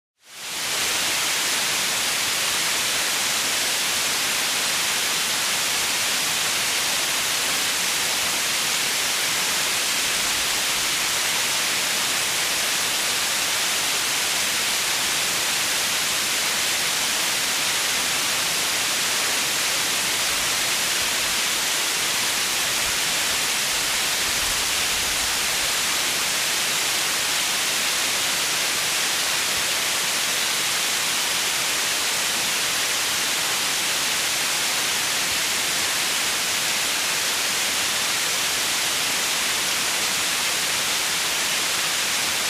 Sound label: Water Fall; pouring